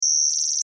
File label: Computer Beep: Odd Effect; Communications; Stuart Duffield